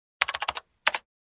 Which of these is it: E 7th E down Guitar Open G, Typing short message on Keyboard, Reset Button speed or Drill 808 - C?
Typing short message on Keyboard